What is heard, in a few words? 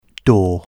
door